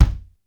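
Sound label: kick, 60s kits; 90 sSampleCDs; Crypton - DrumBase5000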